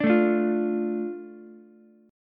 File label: A Suspended 4th Sharp 5th, Ukulele; Asus4#5; strummed; JGuitar